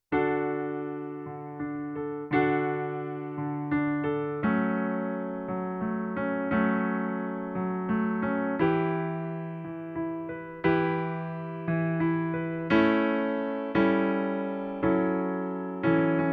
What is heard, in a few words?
Soprano, christmas choir